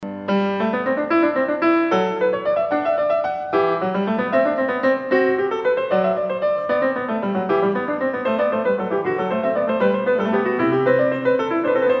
Bach Goldberg Variations

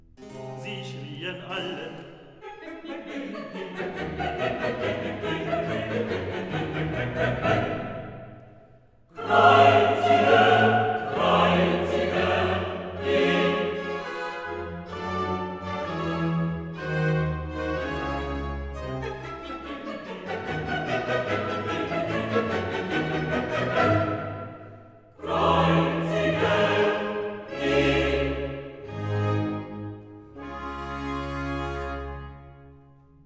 Recitativo, Coro; evangelist Sie schrieen alle; Weg, weg mit dem; Klassika; Handel Masterworks

